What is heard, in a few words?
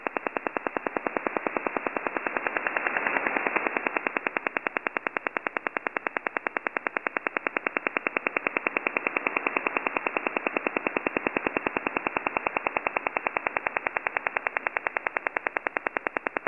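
7mhz broadcast; iarums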